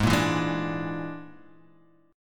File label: Bb 7th Flat 5th Ab